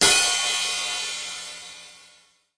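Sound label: Pearl kit hi-hat open; REAL LIVE KITS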